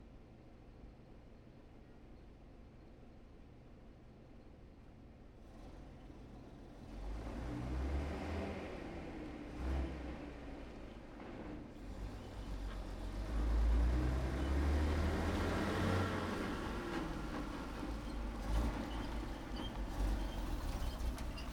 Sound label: M1 Corbitt ext passby, Cargo Truck; Prime Mover; DPA4011; Pole Position